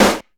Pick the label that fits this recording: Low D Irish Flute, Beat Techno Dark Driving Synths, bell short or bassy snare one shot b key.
bassy snare one shot b key